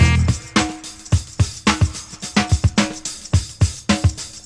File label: 108 bpm high quality breakbeat e key